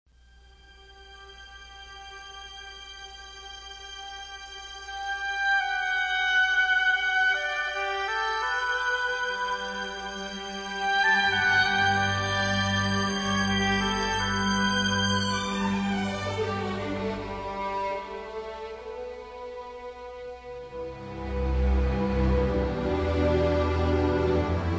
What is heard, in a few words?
Orchestra, Strings; Majesty Orchestra